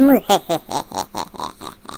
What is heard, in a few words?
laugh muehehehe